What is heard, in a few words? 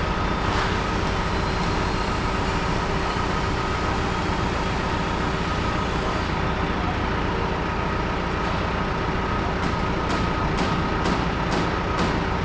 Construction Site Background Noise; blind source speech separation corpus; BSS